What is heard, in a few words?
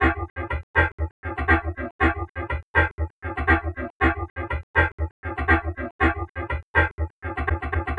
Chuffa, Electronic Beats; Sonic Foundry (Sony Creative Software); Myths of Technology